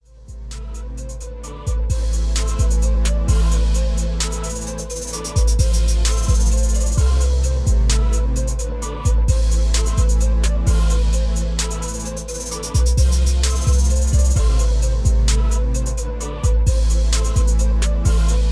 trap

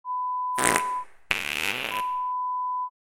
Fart sound

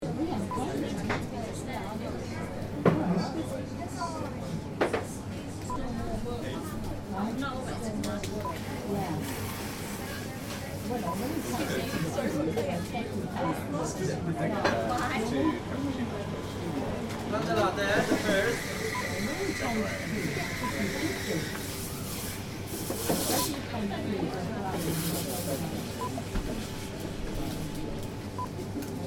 Cafe UK; Starbucks

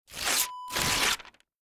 paper rip

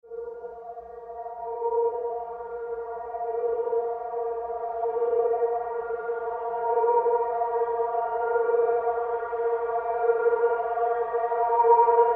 Sci-Fi SFX

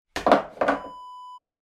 water scoop turn around

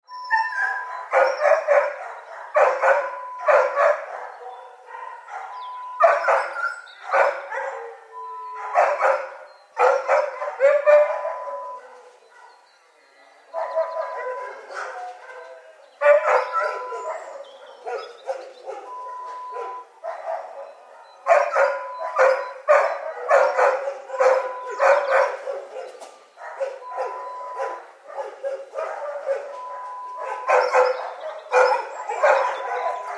Dog Kennels

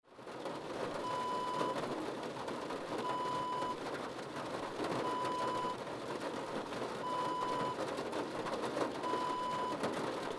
rain on car roof

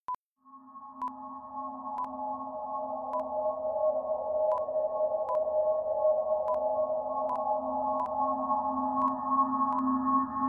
Underwater Cave Ambience

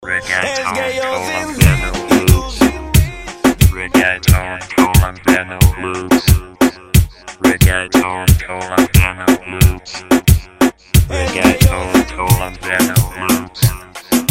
Colombiano Loops

